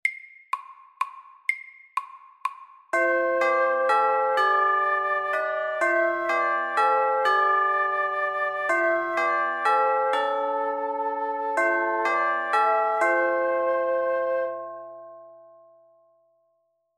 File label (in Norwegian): Klokkekling FORT